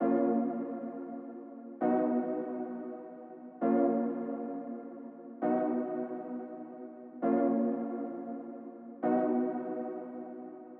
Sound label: 133 BPM D# Min Secondary Chords; Cymatics; Utopia; Destiny Gold Expansion